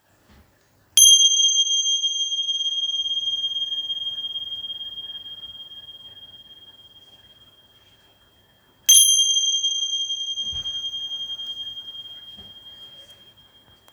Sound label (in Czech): cinelky male, tibetske cinelky